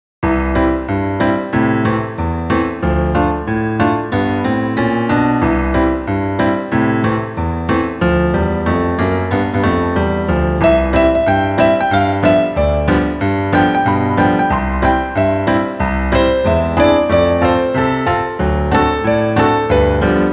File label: Chilled Hawaiian Water